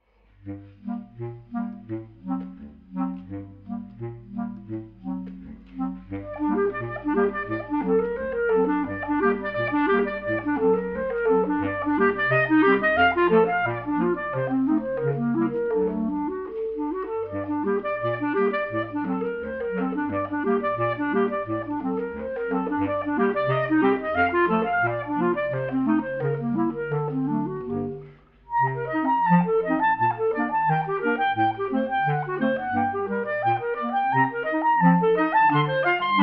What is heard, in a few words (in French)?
Etude, Quatuor de clarinettes; Sibelius